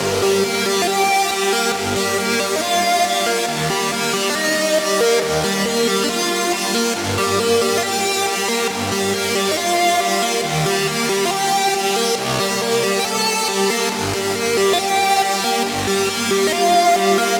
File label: Outlaw Kit 138BPM Main lead WET, G minor; Melody & Synth